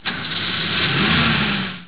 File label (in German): auto; zur Arbeit fuhr